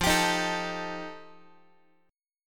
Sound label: F 9th F Guitar, chord; strummed; JGuitar